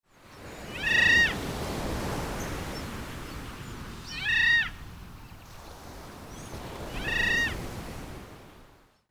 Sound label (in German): Graumoewe Leucophaeus modestus; Vogelstimmen